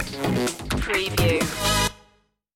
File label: grenade stinger